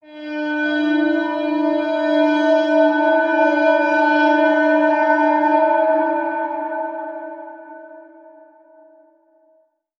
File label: Echo Proc A, alarm siren samples, 120bpm; musicradar